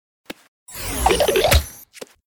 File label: attack; hero sound